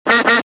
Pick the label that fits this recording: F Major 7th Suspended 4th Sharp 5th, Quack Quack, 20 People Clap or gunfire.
Quack Quack